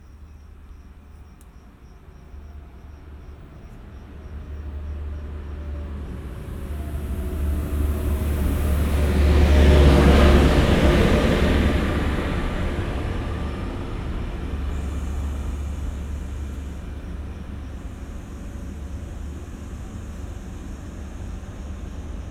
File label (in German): Zug; Lexikon-Roman